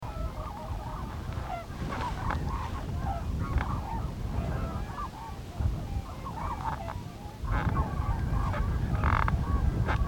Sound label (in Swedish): Glasogontrana